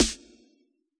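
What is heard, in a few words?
snare drum analog; AnlgKit1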